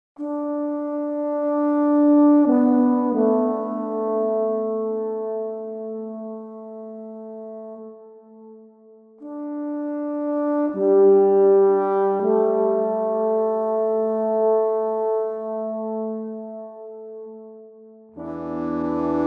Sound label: Quiet Horns